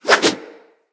Axe Small Spin Swing; Weapons; Swings

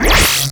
Laser Rifle Stop; Warframe; Crp Laser Rifle

